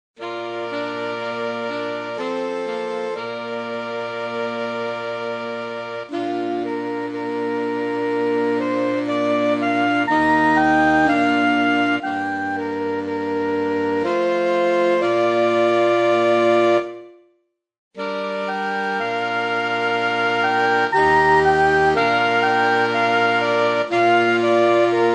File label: akatonbo sax